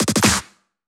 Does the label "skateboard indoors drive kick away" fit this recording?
No